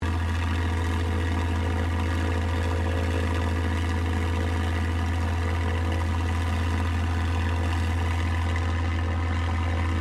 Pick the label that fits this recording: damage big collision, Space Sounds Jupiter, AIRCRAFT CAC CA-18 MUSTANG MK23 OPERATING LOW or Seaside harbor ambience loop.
AIRCRAFT CAC CA-18 MUSTANG MK23 OPERATING LOW